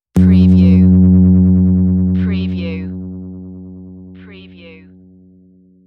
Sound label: Bass Dong